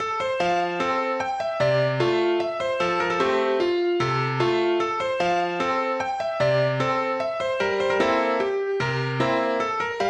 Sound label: Waltz; Coppelia